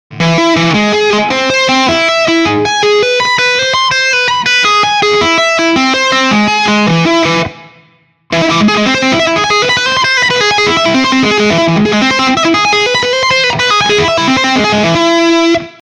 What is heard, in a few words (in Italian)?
Arpeggio aumentato